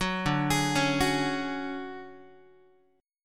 D Major 7th Flat 5th D down Guitar 4A 4E 5A 5D 5G sharp 6C sharp; DM7b5 arpeggiated